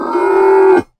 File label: crop camel